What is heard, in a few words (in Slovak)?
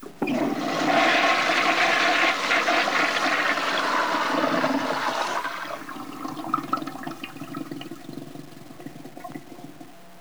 splachnutie; cstrike